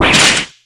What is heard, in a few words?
damage